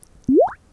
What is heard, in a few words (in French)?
Bulle